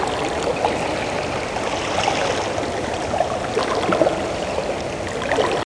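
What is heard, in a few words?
WATER loop; thief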